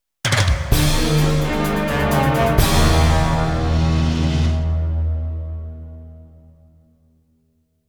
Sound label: Lose; Inuyasha Feudal Combat; PS2